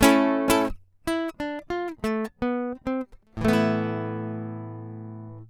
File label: nylon stacato